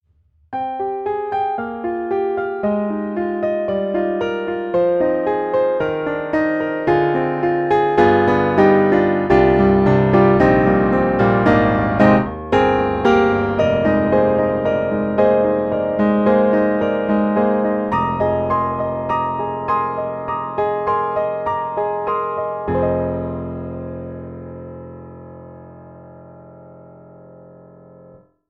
Pianoteq D4 Player Clean, piano; MODARTT S.A.S.

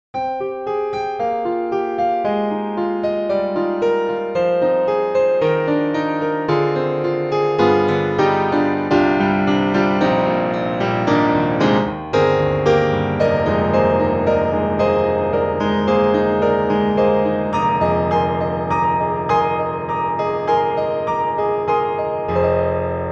Grand jazz; M1; MODARTT S.A.S.; Pianoteq

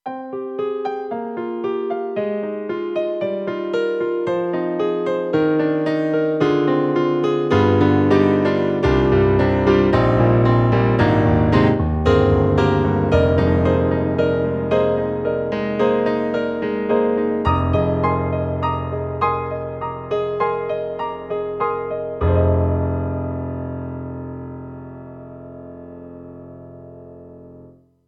KORG M3 XPanded Classic Piano; M3 Version 1 Wave

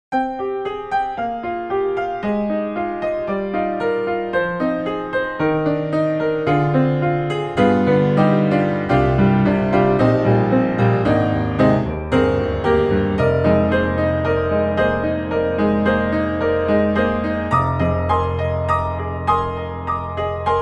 Virtual Grand Piano, Hybrid Piano; Art Vista; Virtual Grand Piano 3

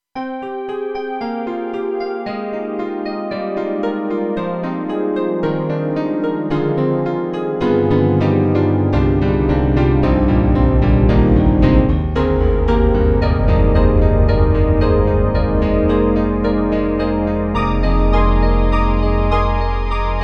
Ballad Piano Stack; M3 Version 1 Wave; KORG M3 XPanded

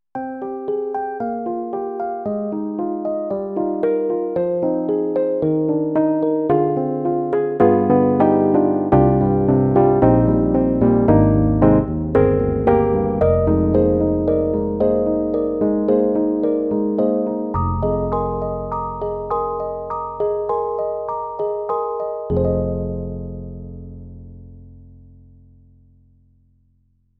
EDIROL SD-90, Contemporary, Soft Rhodes

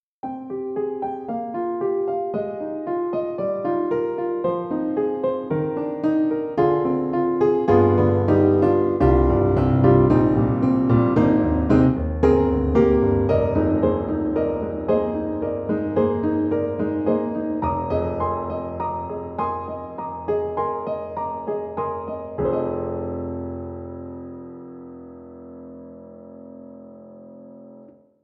Piano; KORG; KRONOS 2

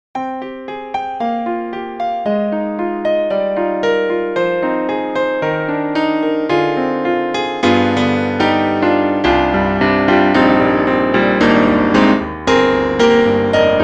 Roland Juno-Gi Monaural Piano

